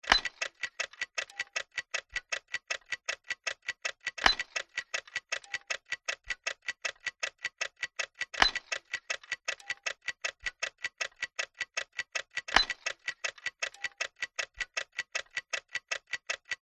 science fiction steampunk gears turn slow ticking; zapsplat

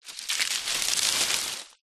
palm tree branch drag forest floor; zapsplat

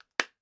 household jewelry box small lid close; sound effects one

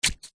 horror stab knife flesh guts squelch

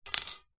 office ruler metal set down concrete; zapsplat

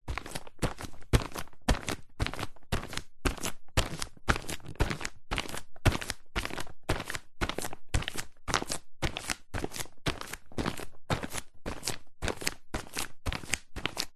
foley footsteps barefoot walking plastic sheet

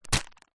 wet wipes packet catch in hand; household; zapsplat

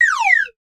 comedy whistle slide down; sound effects one

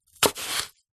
apple slice knife wooden chopping board

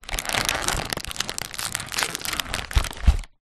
crisps potato chips packet box squeeze pressure; food

